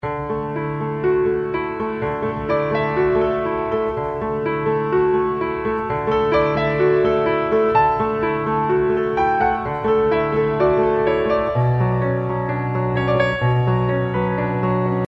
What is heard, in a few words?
Soft Petal, piano